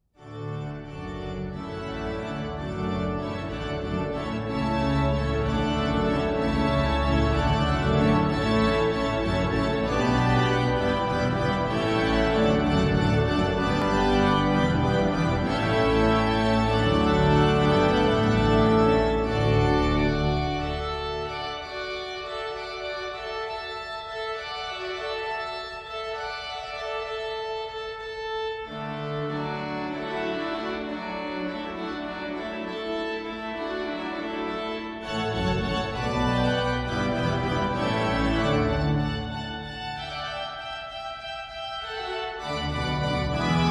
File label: hornpipe; handel